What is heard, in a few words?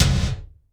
GATE TOM; EFFECTED DRM; Crypton - DrumBase5000